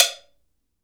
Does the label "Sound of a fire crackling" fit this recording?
No